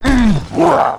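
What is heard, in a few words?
damage, monster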